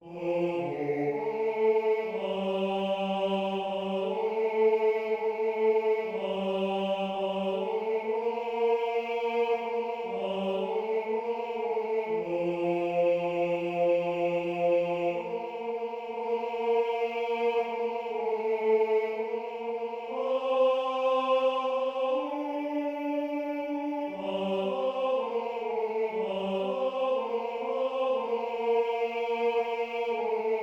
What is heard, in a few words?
irish tenor